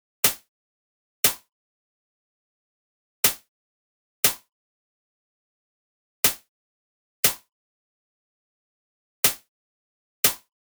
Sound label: Allpass Fast Envelope